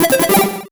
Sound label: MUSIC EFFECT Solo Chip Arp Positive (stereo); startide macos switch windows gamerip 2017